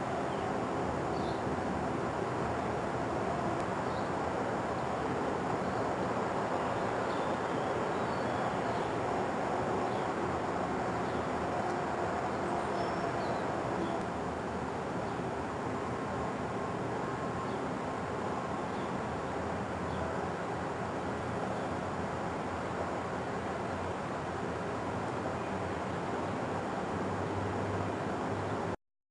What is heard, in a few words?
black&white warbler